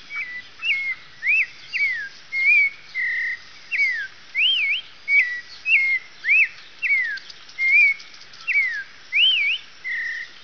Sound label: Clay Colored Robin